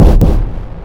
Impact

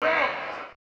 "Yoar", Vox

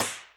perc

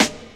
Snares

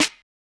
Open Snares

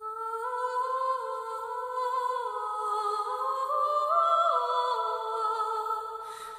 Loop 160, 808

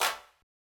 Clap

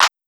Clap

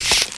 launch lower

